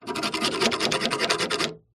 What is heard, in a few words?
metal object scratch rusty metal surface; foley